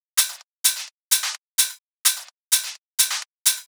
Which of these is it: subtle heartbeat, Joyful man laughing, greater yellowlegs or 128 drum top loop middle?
128 drum top loop middle